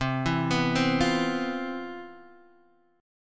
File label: C Major 11th C, Guitar Standard; CM11 arpeggiated; JGuitar